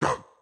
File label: Triple Swipe Attack; Going Under; Caffiend Caelan